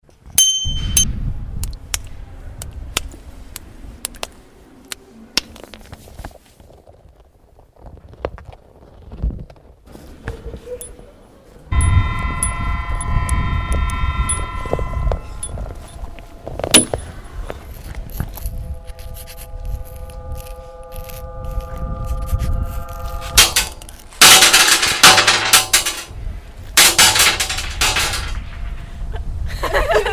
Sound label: Noises